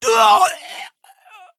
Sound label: Orc Female Death